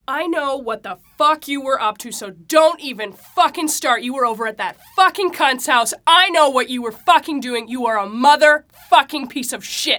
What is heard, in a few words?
angry girl; 'Go Fuck Yourself' samples; lolicore